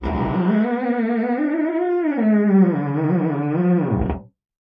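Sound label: door creak large, squeaks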